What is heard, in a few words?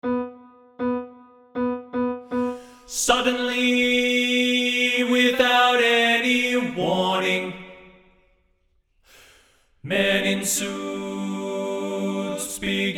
suddenly bass